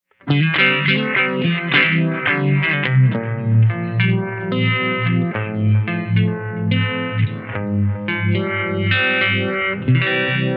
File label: glass nexus uvibe; Damage Control